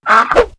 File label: attack